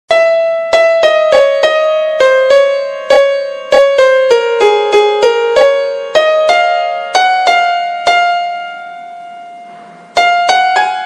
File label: piano ringtone music theme